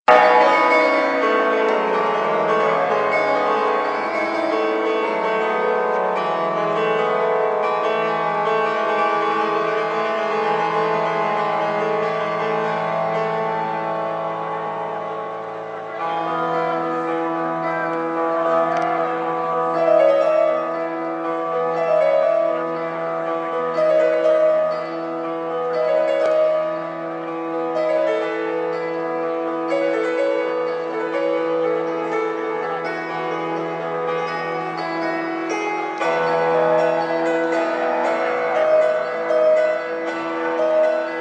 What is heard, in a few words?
piano solo live